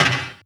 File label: Percy Hit, FX; Sonic Foundry (Sony Creative Software); Esoterik Beatz